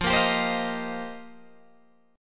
F 7th Suspended 2nd Suspended 4th, Guitar Open G

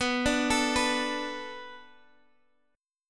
B Minor 7th B Guitar Drop D, chord

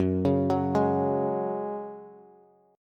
Gb Suspended 2nd, Bass; Gbsus2 arpeggiated; 14,16,16,13

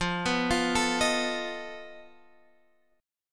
F 7th Flat 5th F down; F7b5; arpeggiated

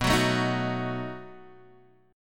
B 7th, B7 chord, Guitar Standard; B7 strummed; x,2,4,2,4,2; JGuitar

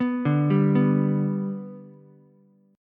B Minor Sharp 5th, Ukulele; Bm#5 arpeggiated; 4,2,3,2; JGuitar

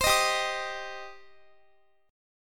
G Suspended 4th Sharp 5th G, Guitar; strummed; 4E,4A,5D,5G,5C,6F; x,x,22,20,19,22

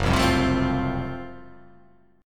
F sharp Minor Major 7th Flat 5th F sharp down

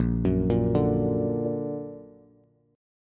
Bb Augmented Bb, Bass; arpeggiated; JGuitar

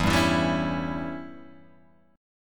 E Minor 6th guitar chord, Open G

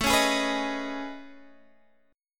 A Major Sharp 11th

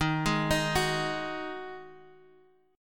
Eb Minor Double Flat 5th, down, Guitar; arpeggiated; 4E,4A,5D,5G,5C,6F; chord; JGuitar

